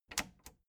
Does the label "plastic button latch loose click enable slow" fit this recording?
Yes